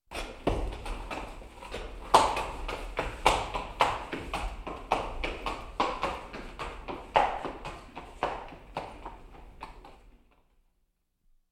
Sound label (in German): pferd im stall; Geraeusche; Auf dem Land